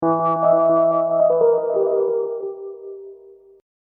Hip Hop Dark Rhodes Licks 90 Bpm Straight E

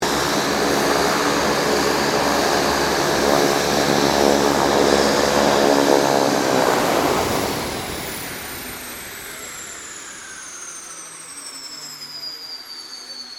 King Air 350 shutdown; Airplanes; Vehicles

